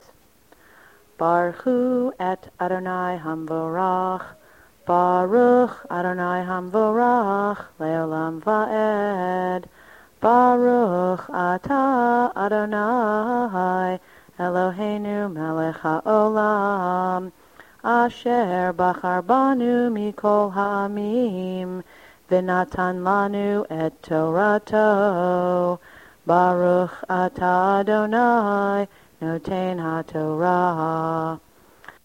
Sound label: torah blessing before; HHD